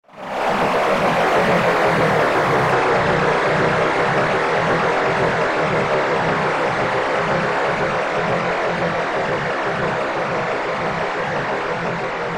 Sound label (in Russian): NLO